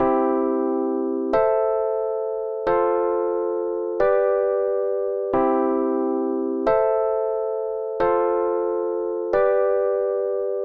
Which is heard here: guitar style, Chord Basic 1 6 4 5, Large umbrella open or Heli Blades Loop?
Chord Basic 1 6 4 5